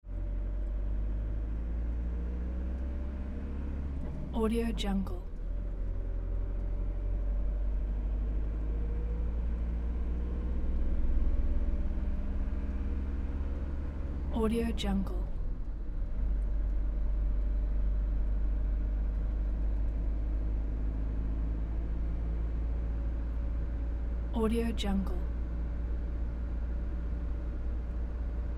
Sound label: box truck interior ambience